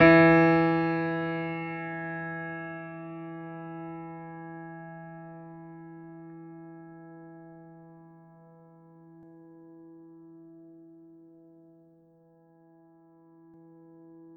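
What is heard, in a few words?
Classical Piano E3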